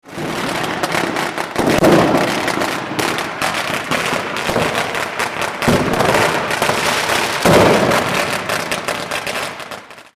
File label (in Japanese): hanabi